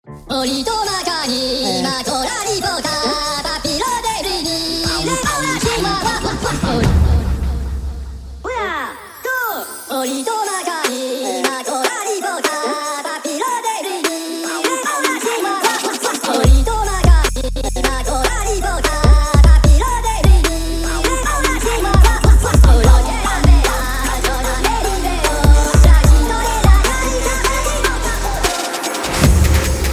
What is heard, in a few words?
jingle bells remix; minions